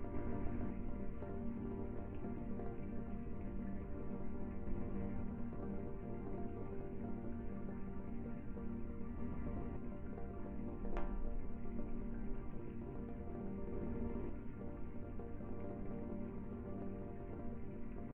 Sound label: Tension Synth Augment